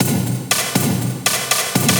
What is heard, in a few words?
drum loops; bb drum loops